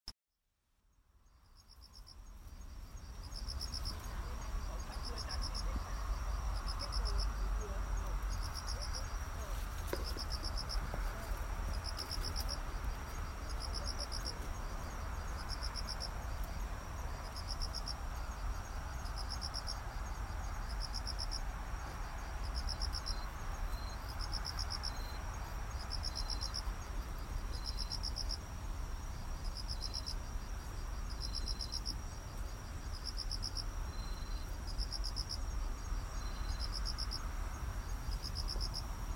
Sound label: crickets